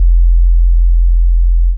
SNGL.BASS, Jungle Rave; Best Service Dance Mega Jungle Rave

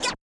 Yamaha DJX 2B, Scratch F

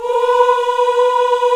VOX African Ahs, African Aahs; Roland LCDP11 Africa VOL-1

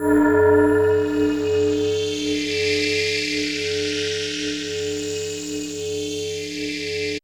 RING MOD; ATMOS

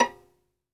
SOLO VI, Synclavier Strings; ILIO

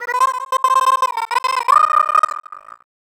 VOICE FX, Dance Vocals

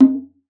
Conga, Session Instruments; Best Service ProSamples vol.42

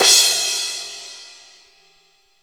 R-8 crash

